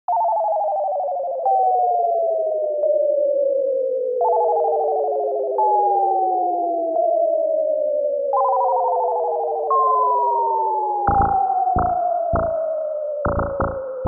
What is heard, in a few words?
Synthdrum